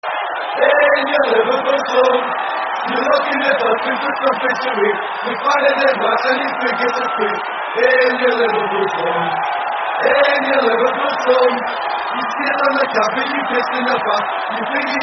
With Crowd; Liverpool Slums; Man United

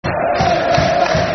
Chant, Football Chant (Soccer Song); Darren Bent Chant; Charlton Athletic Cafc

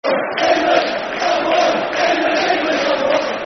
Football Chants (Soccer Songs); England's Number One; Portsmouth Pfc

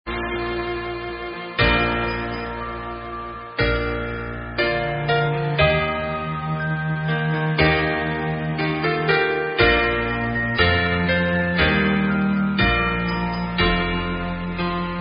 Karaoke Songs; Girl Scouts

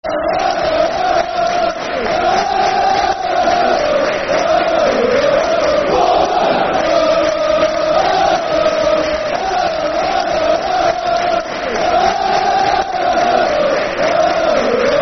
Der, Der Der, Football Chant (Soccer Song); Bournemouth; Afc Bournemouth Afcb

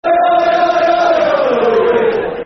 Holly, Holly, Football Chants (Soccer Songs); Plymouth Argyle Pafc

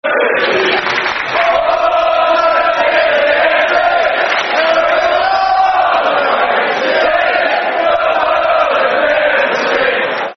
Football Chants (Soccer Songs); Portsmouth City Fc; Portsmouth Pfc

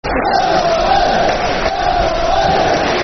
Villa Army, Football Chant; Aston Villa Avfc

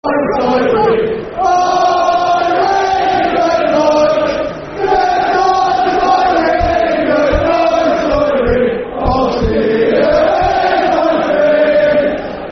Football Chant (Soccer Songs)

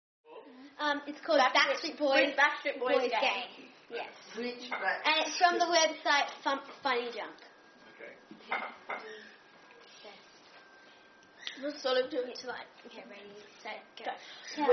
Clapping

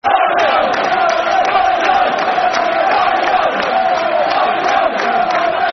Football Chants (Soccer Song); Martin Jol's Blue N White Army; Tottenham Hotspur Thfc

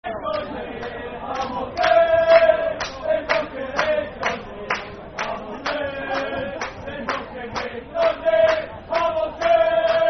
Football Chant, Dundee United; Hamilton, They Hate Dundee; Dundee United Dufc

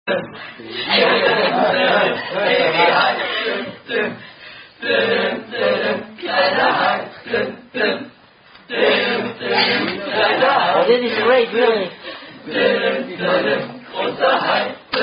German Attack Song

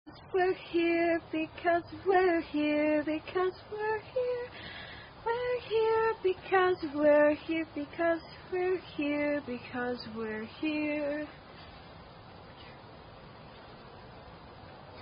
Singing; We're Here Because We're Here (Tune Old Lang Syng)

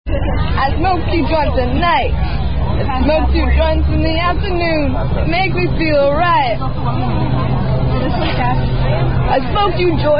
Singing While Smoking; Singin I Smok 2 Joints 420; Singing ''i Somke Two Joints'' While Smoking Majuania

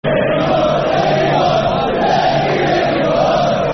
Football Chant (Soccer Song), Newcastle United; Nicky Nicky Butt; Newcastle United Nufc

